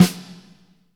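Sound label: snare, ballad, Roland; Northstar - Drumscapes Roland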